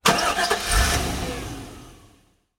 Truck Chevy Start Up Engine Perspective

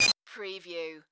Digital Blips Short Circuit Click

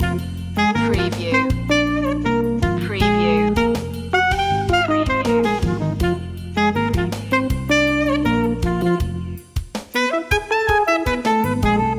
loop

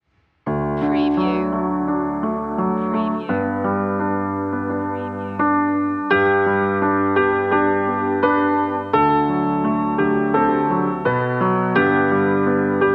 auld lang syne old pub piano 85bpm

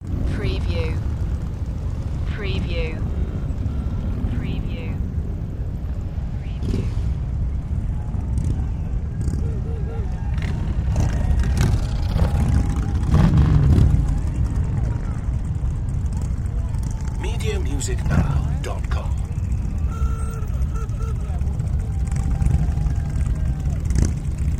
Rolling Thunder

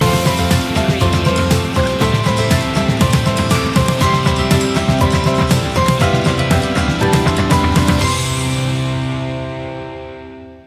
a warm welcome no vocals

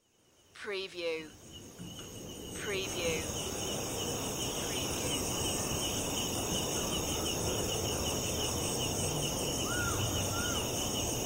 Outdoor Evening Ambience

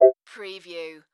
Multimedia Beep

